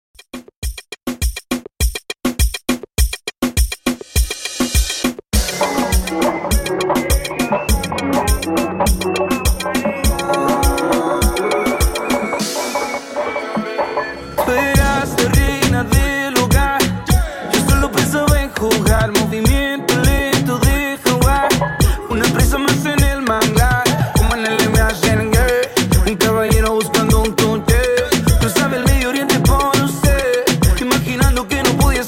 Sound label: Reggaeton 102Bpm